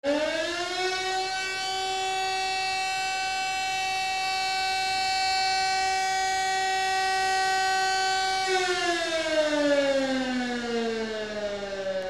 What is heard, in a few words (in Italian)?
fabbrica; suono di sirena di fabbrica